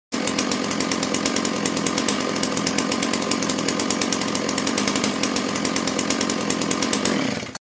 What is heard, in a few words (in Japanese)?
原付バイクのふかし音